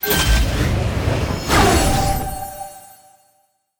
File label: sfx hero unlock; Animation Throwdown